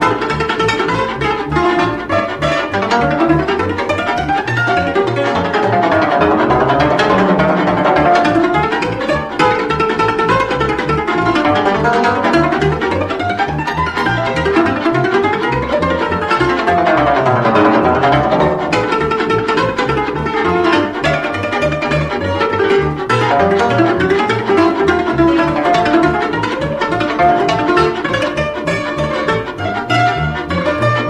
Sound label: sirba drum; tziganes